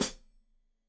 Hat Foot, Hi-Hat, Cymbals Stereo

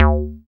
Bass SH-101

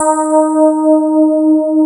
SPARKLES; Wizoo - Powered DX

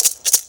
MULTI RATTLE; Best Service; World Colours

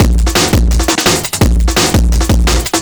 Beat Mooger 170, distorted drum loops 170bpm; musicradar

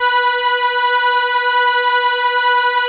ARP SE B3; Sonic Reality; Vintage Timetraveler

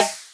Percussions

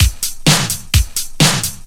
Straight Loop 128 BPM, House; Best Service; House Definition VOL-1

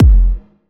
kick verb

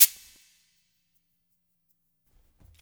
CABASA; InVision Interactive; Percussion Slam

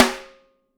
wood; Sampleheads; Peter Erskine Living Drums

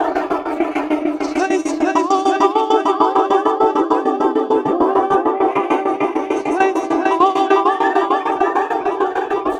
jumbled, 100bpm loops; transx loops

